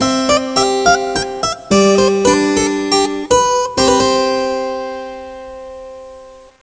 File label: Harpsichord; kentei kyoukai imasara hito ni wa kikenai otona no joushiki ryoku training ds